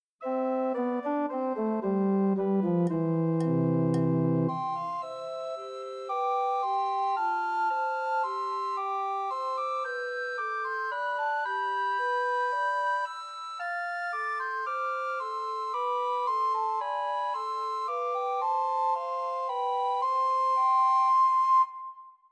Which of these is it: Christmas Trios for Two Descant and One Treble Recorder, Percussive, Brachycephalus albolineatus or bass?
Christmas Trios for Two Descant and One Treble Recorder